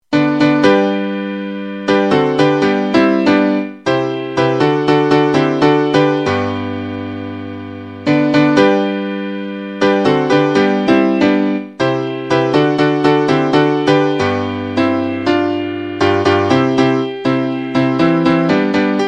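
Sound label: piano all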